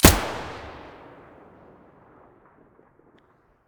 vihr muzzle stereo, weapons

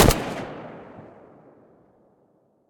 silencer distant, weapons; vpo101

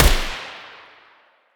muzzle g36 mono distant

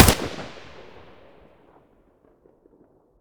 ak74u muzzle stereo; STALKER; IWP

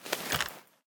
p90 draw, weapons

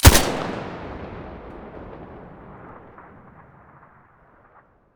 scar muzzle stereo

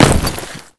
land default; STALKER; IWP

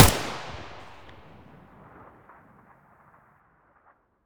ak74u muzzle mono; STALKER; IWP

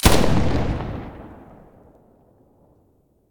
muzzle fn2000; S.T.A.L.K.E.R.; IWP

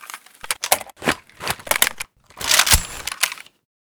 vihr reload empty

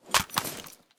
assault draw, weapons; STALKER; IWP

